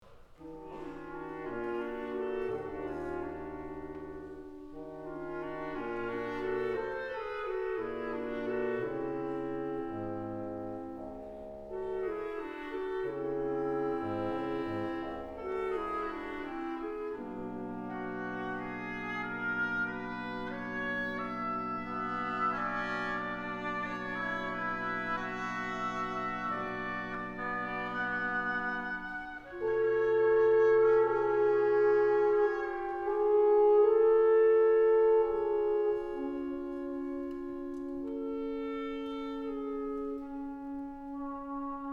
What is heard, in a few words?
Trumpet Concerto II